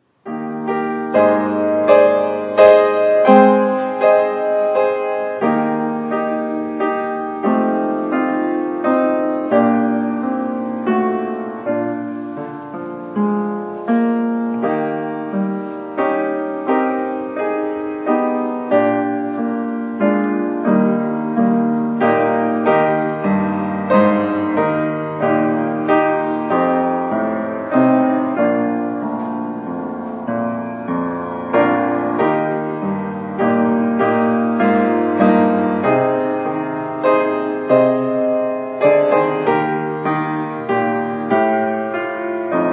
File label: piano; hymn training